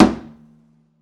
pizz noise body slap, double bass

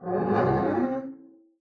double bass arco noise moan

